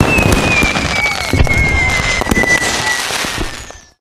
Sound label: summer fireworks; Source Engine; tf